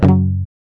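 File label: GUI F#, acoustic guitar; Best Service Gigapack 2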